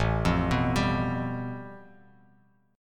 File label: A 7th Suspended 2nd Sharp 5th, Bass Standard; A7sus2#5 arpeggiated; JGuitar